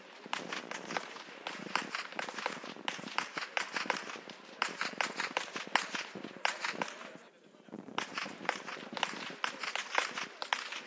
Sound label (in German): schnalzen, jugend; waging